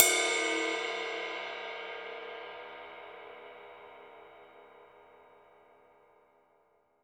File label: RIDE, Drum Dry; East Collexion; Drum 1 Dry